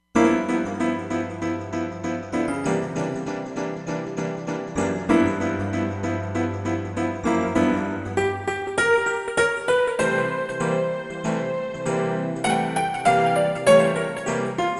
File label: Chocolate EP